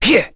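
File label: Vox Attack, English; Dimael; kingsraid; voices heroes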